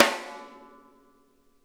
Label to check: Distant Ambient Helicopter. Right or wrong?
Wrong